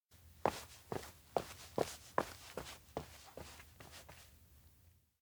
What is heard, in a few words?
steps outdoor lakeside; aino; anemoi